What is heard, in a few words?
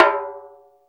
TABLA HIGH; AKAI S6000 CD-ROM - Volume 5